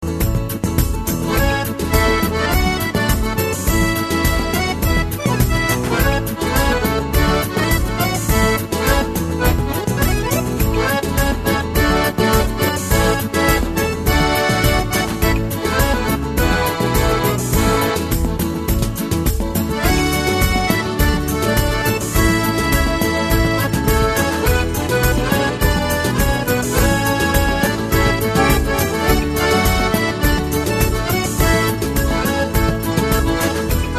Ruumba Napolitana

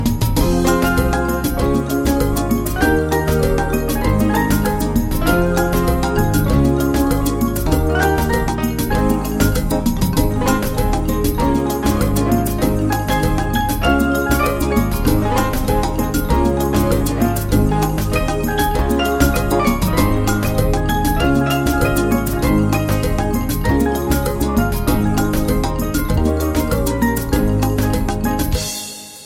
Latin Jazz

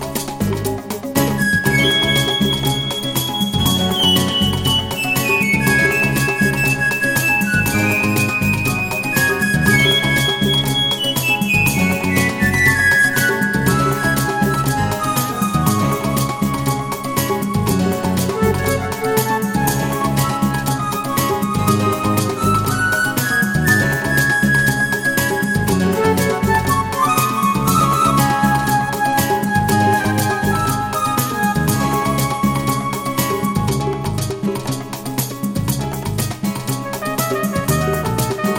Guaguanco

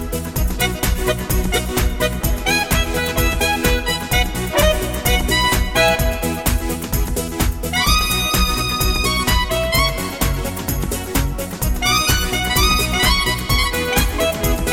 Dance

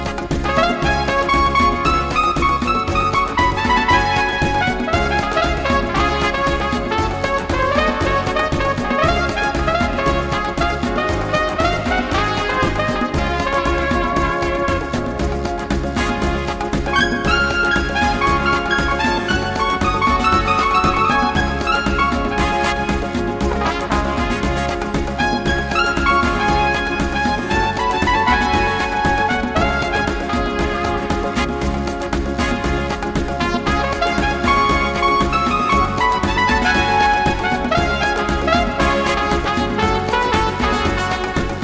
Paso Dance

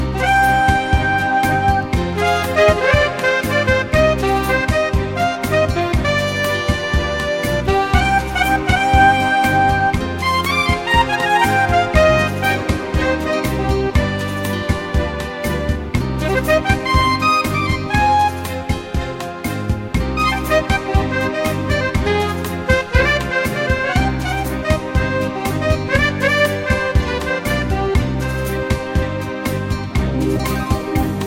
Classic Beguine

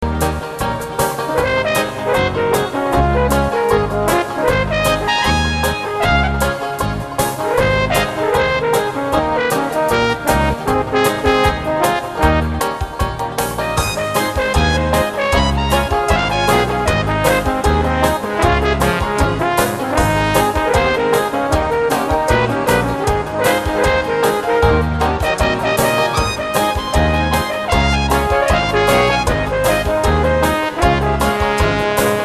Ragtime

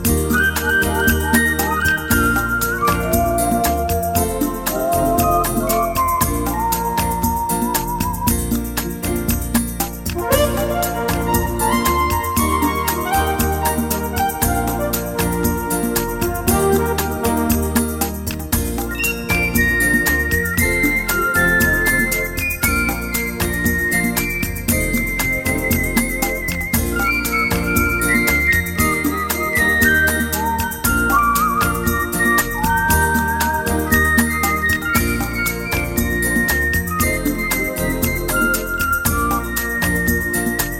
Orchestra Bossa Nova

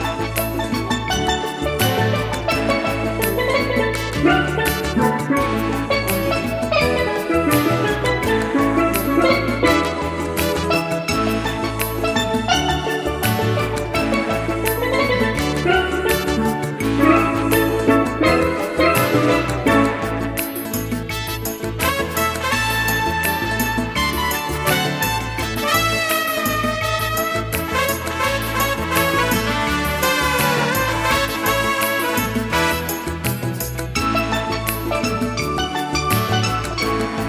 Reggae Blues

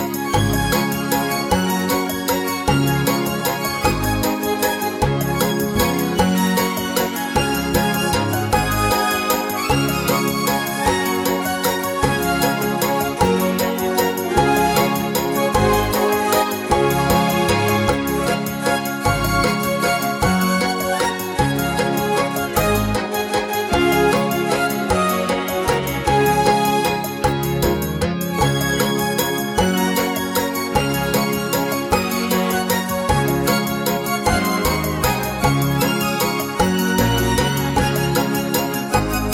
Italian Mazurka

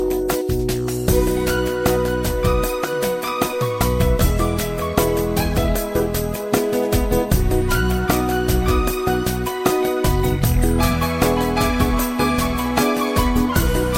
Kool Beat

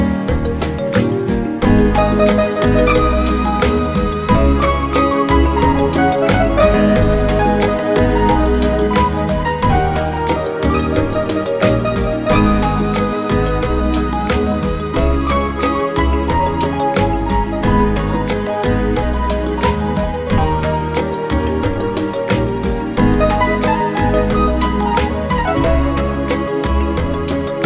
Latin Bolero

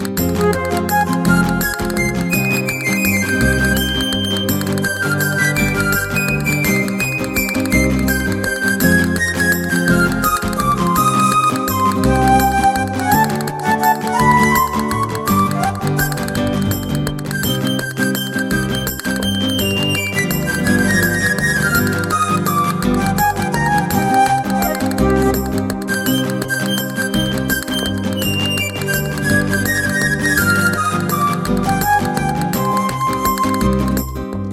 Flamenco 4 4

